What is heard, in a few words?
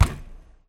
slam